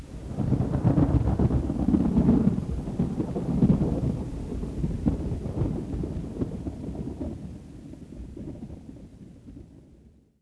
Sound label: thunder